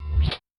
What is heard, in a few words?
FRAG, Yamaha DTXpress-II, FX